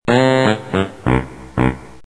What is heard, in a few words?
farty